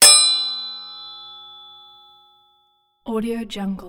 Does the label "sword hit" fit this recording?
Yes